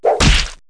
Bat hit Sausage; Rabbids Big Bang; Android iOS Windows gamerip 2013

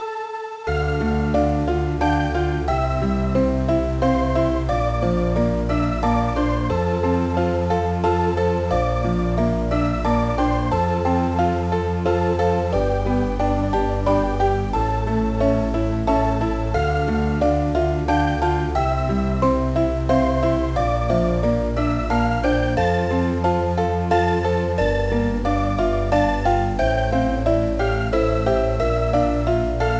BGM; MAR Heaven; GBA

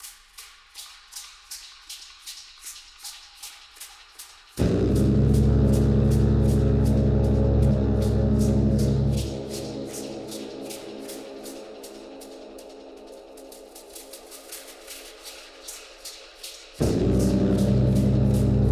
Combat Fight Hard DANGER; Eden Rising

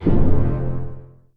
UI Toggle

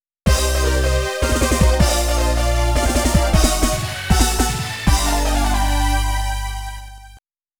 Stage Starting; Super Fighting Robot; arcade

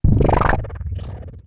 Pickup Power Up

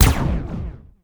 BLASTER Long Average High Mid Mono; chicken gun

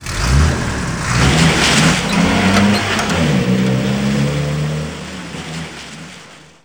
Unit load; Gary Grigsby's War in the East

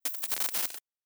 Glitch

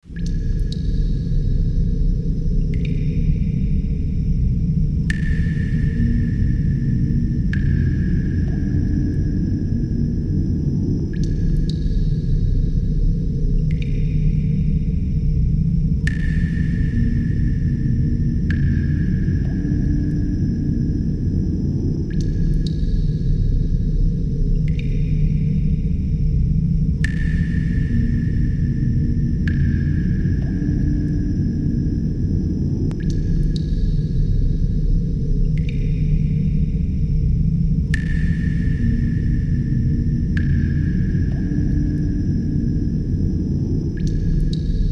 ambient water

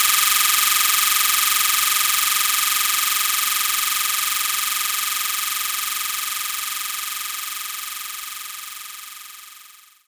Guiro Short; knights try

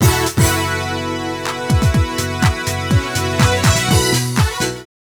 Bowling Intro; Monkey Bowling Intro

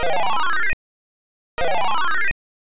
Door; Mighty Bomb Jack; NES

